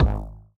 ball bounce wave; CrossCode; battle

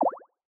popup

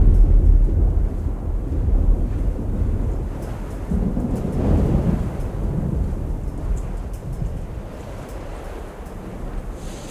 loop before It Rains; World Of Goo

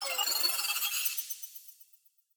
teleport out

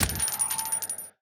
parrot gun tail; battle

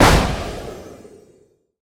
bot ground punch ice; mine; battle

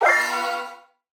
cold mode

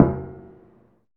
footstep metallic; The Swapper

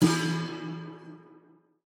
ball gong high

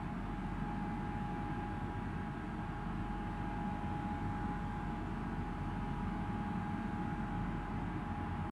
cargo ambient; CrossCode

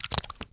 wiggle; World Of Goo

